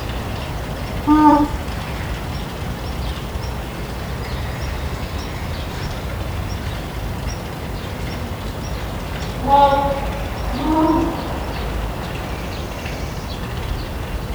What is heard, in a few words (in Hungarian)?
tobbszoris szellozteto, papaszemespingvin; debreceni zoo 2012; marantz puska